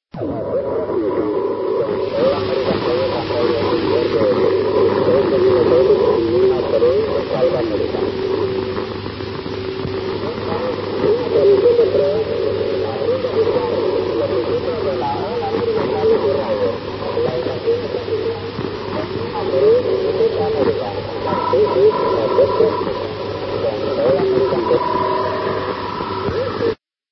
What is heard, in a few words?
usb